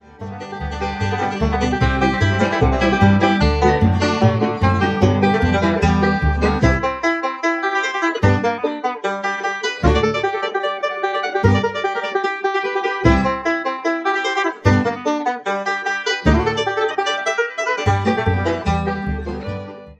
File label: Rag, banjo, bluegrass